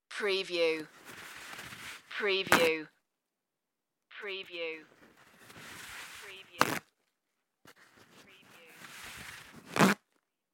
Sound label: paper towel use